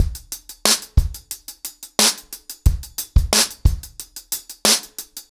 ACOUSTIC DRUMS, 90 BPM

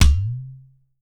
utar low

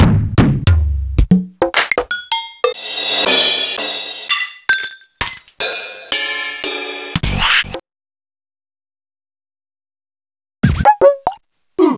Yamaha TG-33

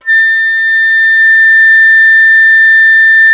FLT, WOODWINDS; Roland - LA Composer vol. 1

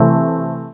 chord

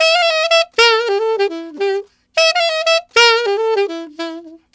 Alto in E min, Sax; Sonic Foundry (Sony Creative Software)

